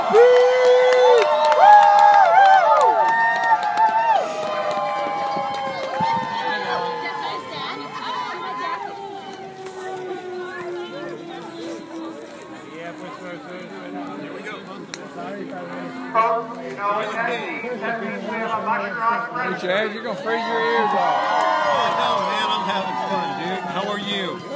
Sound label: Siren